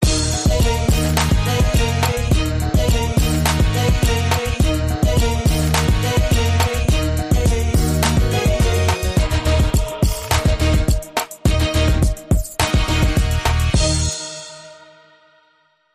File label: Trance